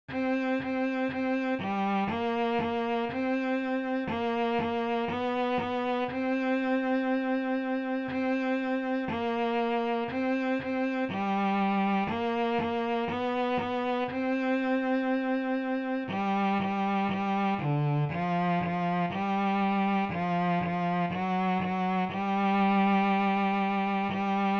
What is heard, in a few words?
Sightreading 2nd Position intro, Bass; Bass for Camp; More Positions